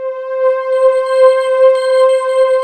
JV-1080 WARM SHIM; VINTAGE-EXP

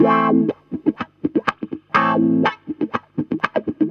GTR, Roots Disco; USB Soundscan vol.32; AKAI

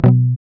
GUI B, Acoustic, Roland

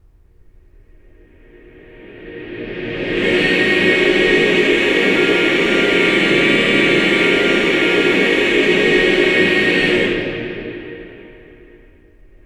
CLUSTER REV, Symphony of Voices; CLUSTERS

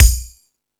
Thundadome, E-MU Pro-Cussion

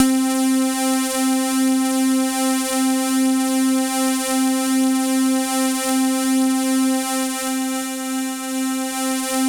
C3, Synth Line; 90 s Sample CDs; NiceBeats; SynthLine VOL-14

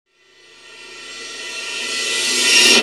Hat, EuroDance; Best Service; Ethnic Journey Sound Cube; EuroDance Essentials

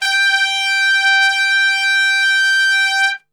G 3, Brass Super Section; Best Service

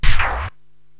DJ Reverse Stop

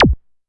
Blip, Drumset; Roland SH-32

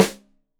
Rim Shot Stereo, drums

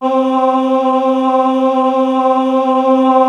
CHOIR; Greytsounds; Scott Peers Sound Engineering

